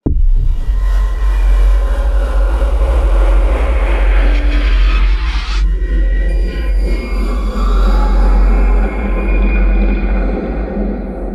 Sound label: Tension Builder Impact